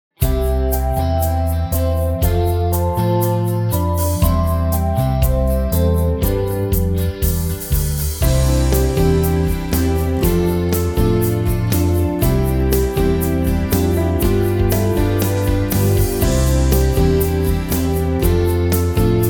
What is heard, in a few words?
instrumental